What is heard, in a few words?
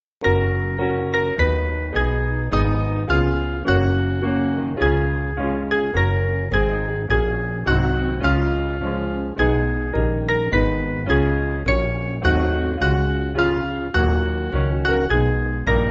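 Piano, Free Music Backing Files for Churches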